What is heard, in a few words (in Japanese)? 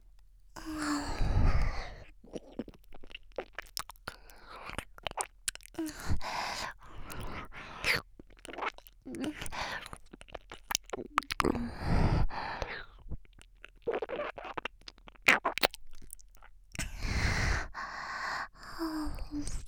ゆっくりなぶるような耳舐め